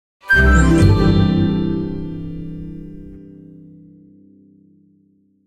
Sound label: level end; Mortar Melon; wii u gamerip 2015